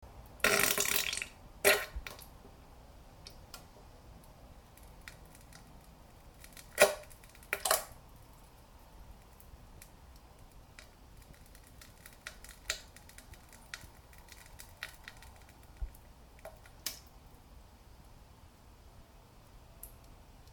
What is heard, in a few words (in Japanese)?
げりよりなんべん ぶりゅりりりぶりゅ音